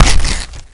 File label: claw strike